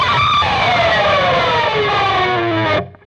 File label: PRS SCRAP, Guitars; PRS SCRAPES